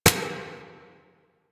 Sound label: Turret Low Clip; Weapons; APC; Warframe; Lasrian